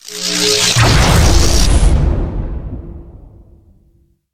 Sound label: explode